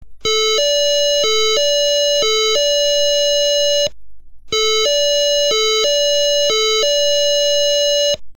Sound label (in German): Martinshorn; Melodiesound; Martinshorn-Notenmelodie; Bastelanleitung